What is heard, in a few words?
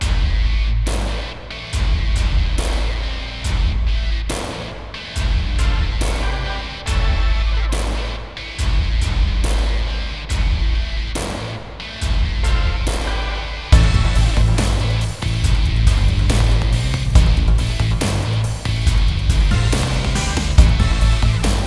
Level Sports Loop; Hookbots